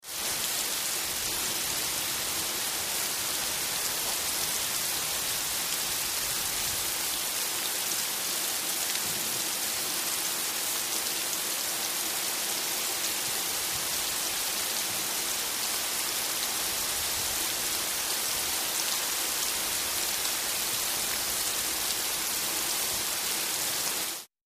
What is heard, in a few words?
Hvy Rain, ext